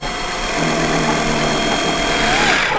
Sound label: ship tail open; Earth and Beyond; EA Games